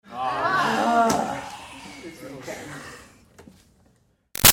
Crowd High School Class Awwh Sighs

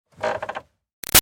Car Highlander Parking Brake Pedal Down; 2005 Toyota Highlander V6; Creak; Cars; Vehicles

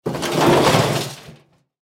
Garbage Can Drag, Garbage Can Drag Over Dirt Rock; Household; Blastwave

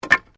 Wood Drop; Material components for constructing more complex sounds; Materials

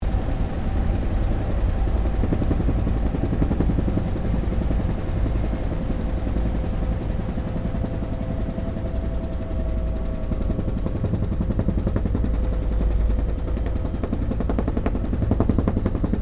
HELICOPTER BELL UH1 IROQUIOS FLYING INTERIOR; RAN UH-1 Iroguios; Helicopters (Sound Effects:Vehicles)

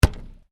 Football bounce wood, Soccer Ball Bounce On Wood; Sports; Stuart Duffield

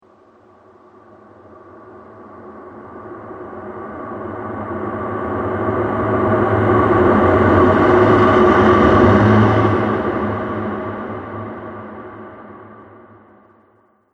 Ghost Of The Cosmos; horror; Ghost Sound Effects

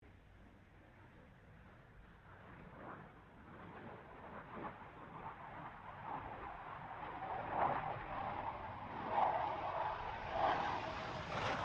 BAE Hawk 127 pass; Airplanes

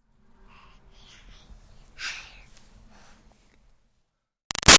Baby 6 Month Old Breaths; Effort; Babies; Voice; BigRoomSound

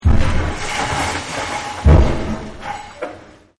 Big Thumps; Smashes; Sound Effects:Objects

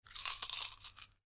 money coins in hand movement; foley; Objects; McKinney Sound

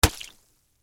Splat Wet Drop, Sponge; Texture; Drops (Sound Effects:Objects); BlastWave

